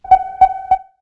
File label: battle dididi